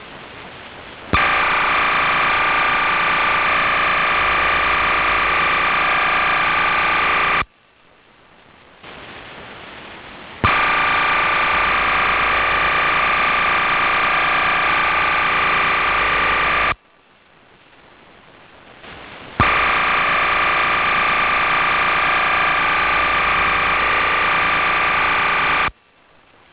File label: am 6khz